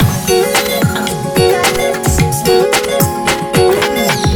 Kicky Pop; Sony; Xperia Movie Creator Music

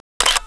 shutter sound; Motorola Q9c

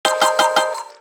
Glam alert; Motorola Atrix-2

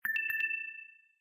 Low Battery in call

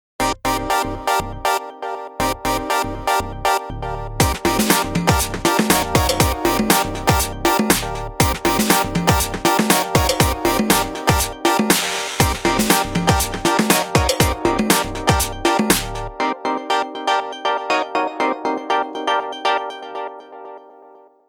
Vinyl style, phonetones Nokia N8

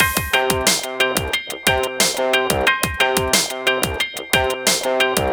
Electro Rock, ringtones; Google; NewWave Labs

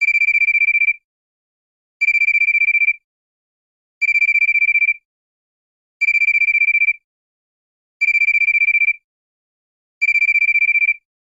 Cricket, ringtones; LG VS740 Ally

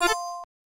cam start; KV920

